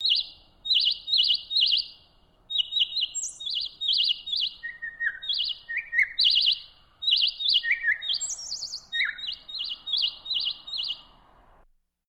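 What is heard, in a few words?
nightingale sings, warbles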